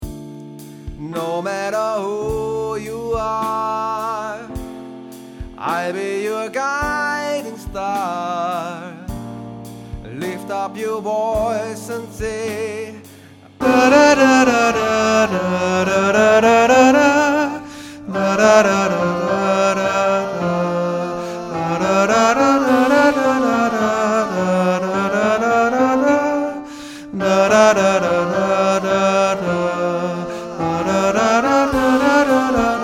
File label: Tenor